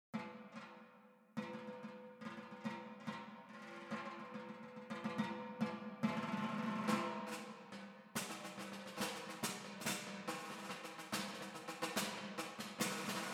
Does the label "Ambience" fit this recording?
No